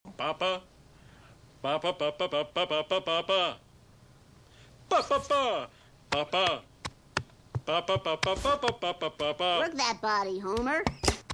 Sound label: hum